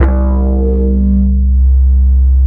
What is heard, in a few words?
BASS, Drum & Bass, 170 BPM; Ueberschall; Drum & Bass Flash Trackz